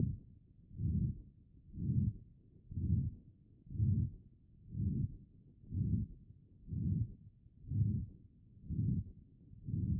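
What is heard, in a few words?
HEART, heart sound; B MODE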